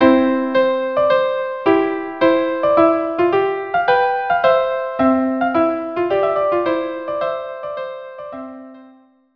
viola duo; La Majeste; telemann

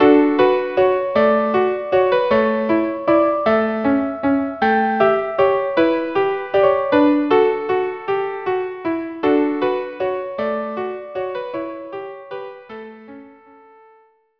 La Rosa duo, violin violin or violin viola duo

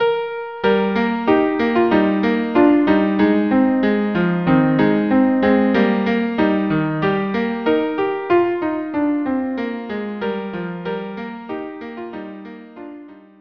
viola duo

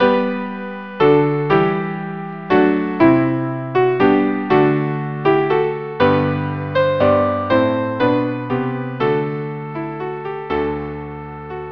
galliarde violin piano; violin and piano or organ; frog galliarde

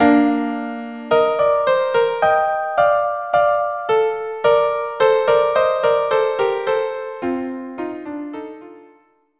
violin duo; L'Esperance

